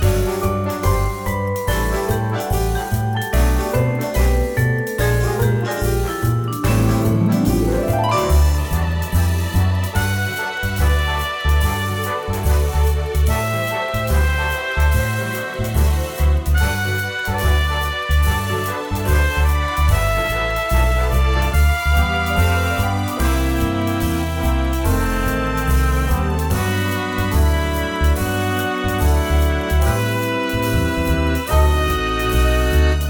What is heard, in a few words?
Main menu music; Cooking Dash DinerTown Studios